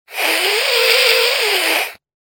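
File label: sounds of vampires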